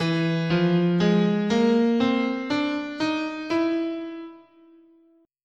E Enigmatic, guitar, scale

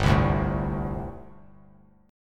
G Suspended 2nd Suspended 4th; Gsus2sus4 strummed; JGuitar

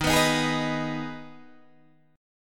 Eb Suspended 4th Sharp 5th, Guitar, Open D; 13,14,13,14,14,13

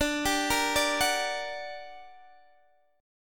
G Minor Major 7th D

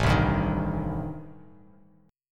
A-Minor 7th Flat 5th, Bass Standard, chord; Am7b5 strummed; JGuitar

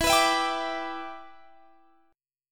E Minor 7th, Guitar, Standard; x,19,21,21,20,22; strummed; JGuitar

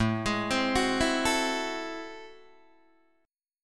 A Minor Major 13th, down, Guitar, DADGAD; AmM13; arpeggiated

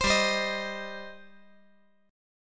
G Suspended 4th Sharp 5th G, down

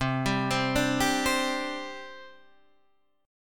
C Suspended 2nd, C, guitar chord

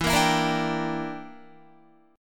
E Minor 7th Flat 5th, Guitar; strummed; 12,13,14,15,14,14; 4E,4A,5D,5G,5C,6F; Em7b5

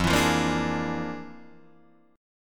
F Minor Major 13th, Guitar, DADGAD

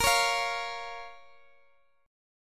Bb Minor Bb down Guitar Open G

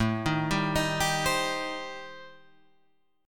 A-Minor 7th Flat 5th, Guitar Drop D, chord

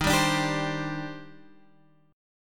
D sharp Major 13th D sharp, chord

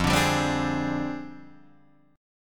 F Minor 6th Add 9th F down Guitar Open D

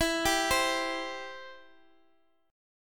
E Minor Sharp 5th, Guitar; Em#5 arpeggiated; x,x,x,14,11,11; JGuitar

